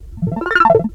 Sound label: ARP, House arp loops; Best Service ProSamples vol.10 - House; AKAI